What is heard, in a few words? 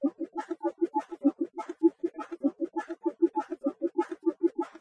Bongo